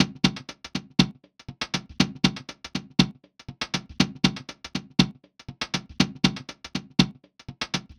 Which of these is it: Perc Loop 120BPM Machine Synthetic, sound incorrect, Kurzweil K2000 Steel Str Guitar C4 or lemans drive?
Perc Loop 120BPM Machine Synthetic